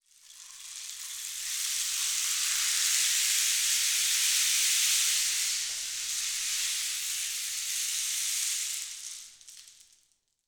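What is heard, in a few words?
rain stick; Spectrasonics; Heart of Africa 1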